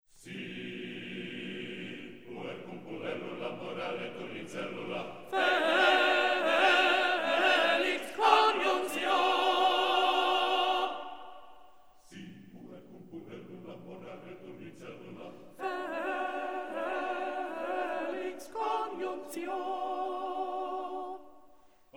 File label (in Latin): Si puer cum puellula Tenor up to tempo